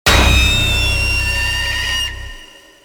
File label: jumpscare; Garry's Mod; poppf patient